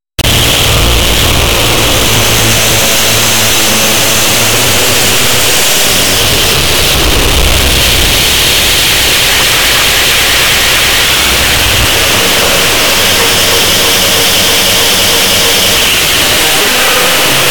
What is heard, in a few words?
noise; Fleshroom